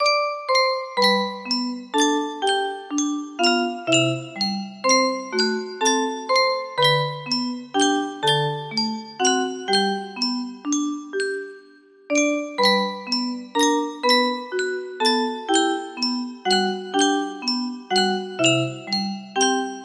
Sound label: aruba national anthem, music box